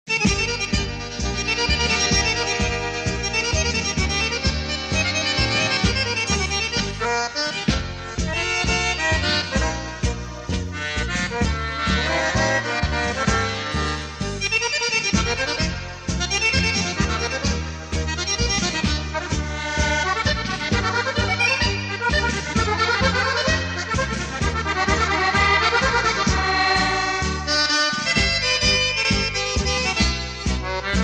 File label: bandoneon